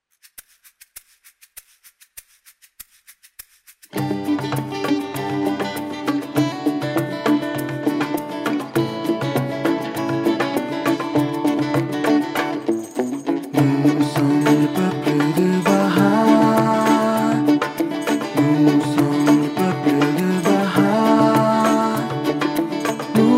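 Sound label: Song, French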